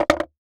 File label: Skateboard Hop On Cement; Movements; Sports Movements